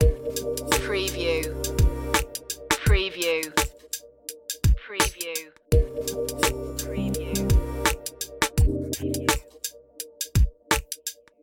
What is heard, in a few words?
abstract vibes loop, chill